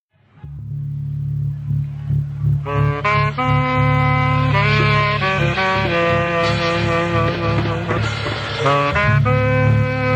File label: African Band